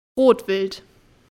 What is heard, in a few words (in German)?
Rotwild; wildtierkamera; kinder